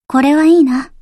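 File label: attack; hibiki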